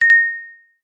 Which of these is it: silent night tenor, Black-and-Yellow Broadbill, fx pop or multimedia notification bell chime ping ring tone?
multimedia notification bell chime ping ring tone